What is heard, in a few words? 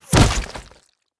bullet impact cloth flesh; Rust